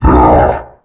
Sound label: commando jump